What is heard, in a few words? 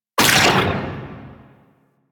Arch Railgun Mech; Warframe; Archwing; Weapons